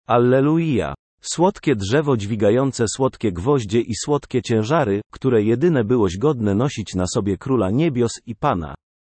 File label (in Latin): Alleluia Dulce lignum